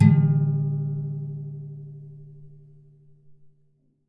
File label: Classical Guitar Extended Technique Plink